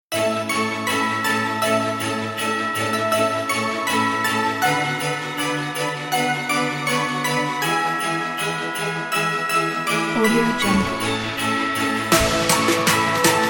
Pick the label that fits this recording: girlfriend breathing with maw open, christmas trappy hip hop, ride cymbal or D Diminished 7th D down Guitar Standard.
christmas trappy hip hop